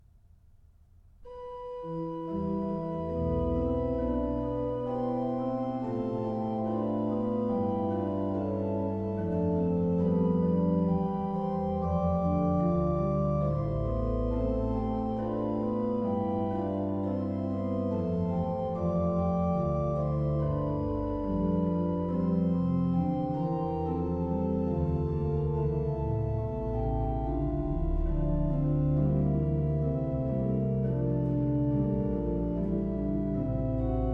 Da Jesus an dem Kreuze stund, BACH ORGAN, Chorale preludes; Orgelbüchlein